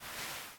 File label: Beanbag Stand; Sittable